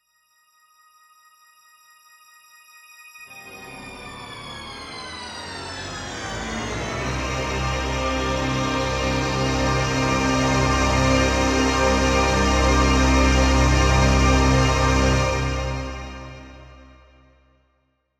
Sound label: Zen Jingle; Jackie4ever